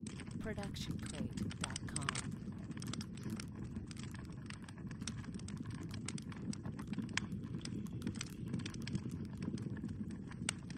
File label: Indoor Fire; SoundsCrate